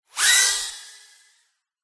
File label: drone mini launch fly away; vehicles